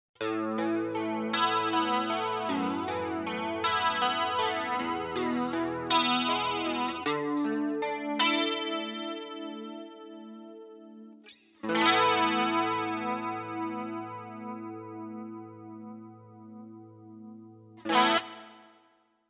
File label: Rich Chorus